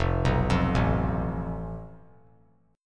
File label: F Major Flat 5th; FMb5 arpeggiated; JGuitar